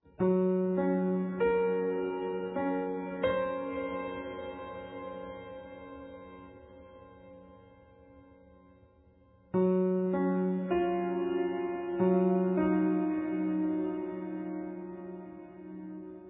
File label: piano texture; 闇夜に煌めくピアノの旋律; ストリングス、ドローンと ピアノが幾重にも重なり、 幻想的な曲調に魅了されます。